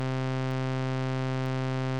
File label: Instrument